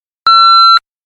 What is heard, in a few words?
Exterior - single bleep rec from close perspective, as for arming (Peugeot 205GT); Car Sound Effects; BBC